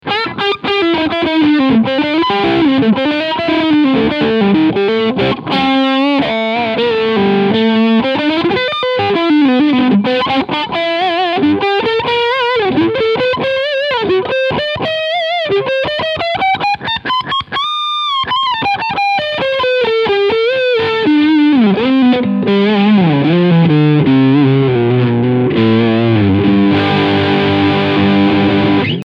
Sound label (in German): Crunch Steg Mitte